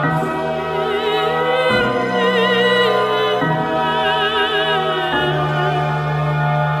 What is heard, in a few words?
voice, Sad